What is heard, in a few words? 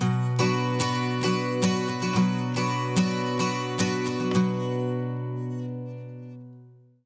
MIN 12 S, Acoustic Guitar Loops; USB Soundscan vol.04 - Electric & Acoustic Guitar Loops; AKAI